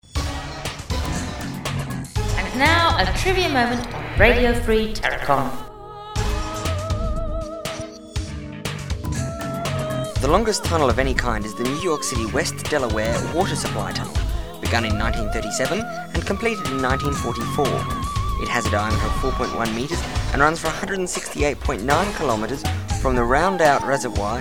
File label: Tunnel